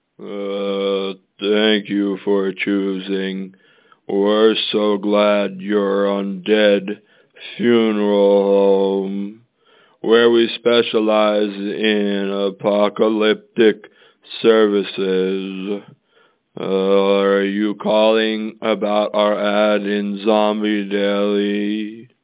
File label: Zombie